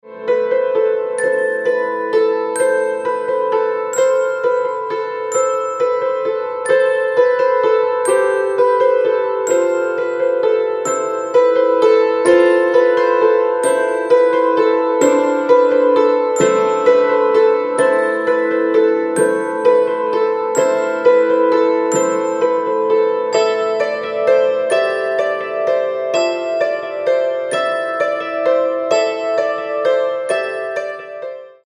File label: Bell Carol